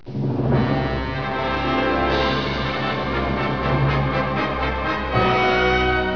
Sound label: Fanfare